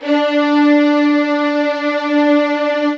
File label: LD2 SUS D4, LOUD VIOLINS; Q Up Arts; Denny Jaeger Master Violin Library